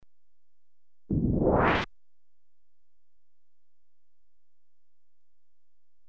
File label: Sliding door ( rapid, no hum), Electronically Generated Sounds; Sound Effects Library